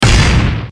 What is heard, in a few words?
Hammering; Cookie Run; Roll Cake